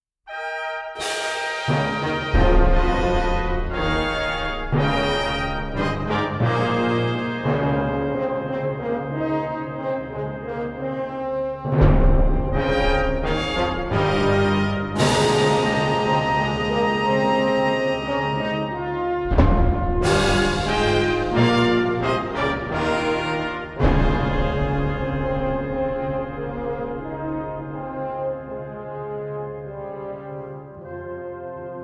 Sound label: Fanfare Chorale